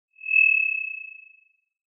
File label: Sound of an owl